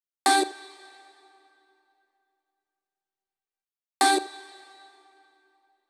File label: 128BPM Vox; Vengeance Samples; Hopper Kit